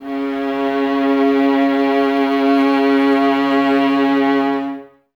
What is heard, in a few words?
violas C#3, String Ensembles, Exprsive; Miroslav Vitous